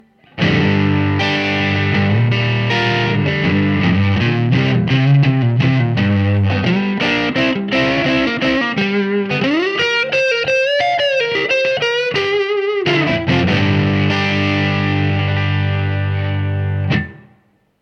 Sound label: tele neck; MACBROS